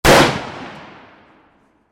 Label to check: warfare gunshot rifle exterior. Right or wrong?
Right